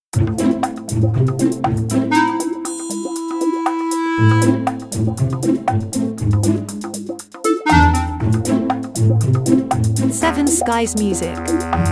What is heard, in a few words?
Christmas comedy Stinger